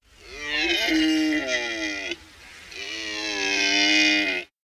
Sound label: deer